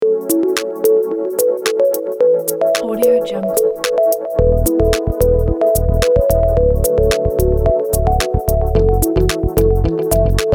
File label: minimal pop timeout waiting